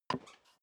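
step dress boots wood creak single stair down, footsteps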